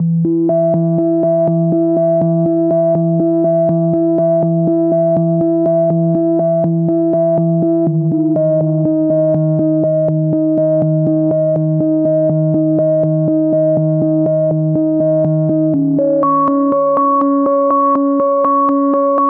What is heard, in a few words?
122 BPM Melodic Arps A maj F min Tonic D; MMB